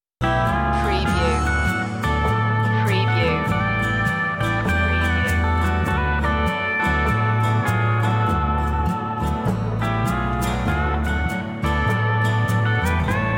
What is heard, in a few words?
hawaiian lullaby